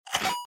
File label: bite